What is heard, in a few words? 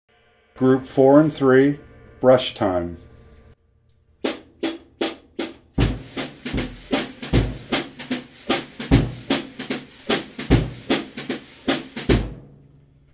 brush time